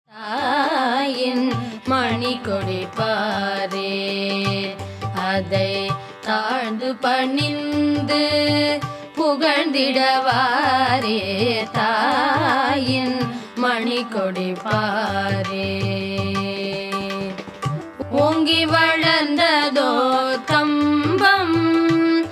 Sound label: Flag Song